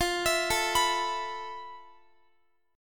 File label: F Major Sharp 11th, Guitar; arpeggiated